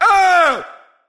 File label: Augh